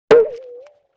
Saw Ploink, Vintage Cartoon

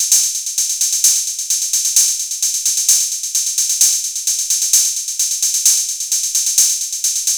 Drum Kit Hat 130, Techno Drums; Drum Kits; Stems; Ghosthack; Drum Kit 001

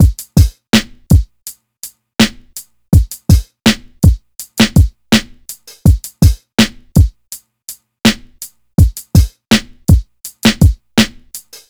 Drum Loop - 164 BPM, boom bap; Darling

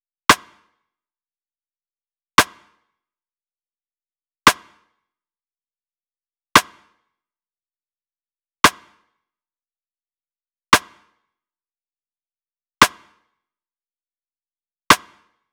Drum Loop 115 BPM Rim; Loop Stems; Cymatics; Vibes II Drum Loop Collection; Round Two

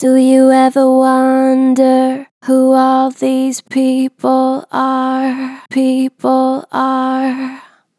Natural Vibrato Gentle, Harmor Vocals

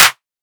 clap oneshot; GhostHack

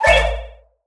Gravity Switch FX AMaj7; Video Game; Cymatics; Video Game Sample Pack Vol 1

